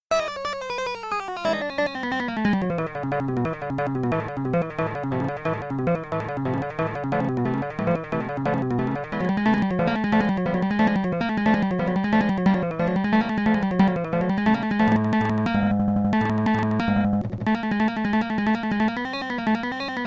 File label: Guitar